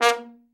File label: trombone; Best Service; Killer Horns